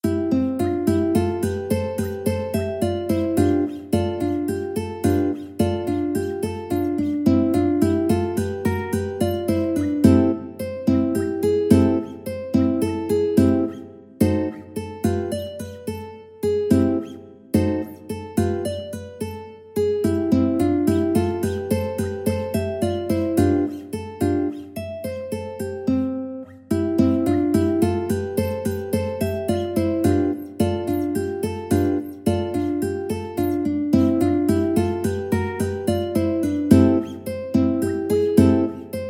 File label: ukulele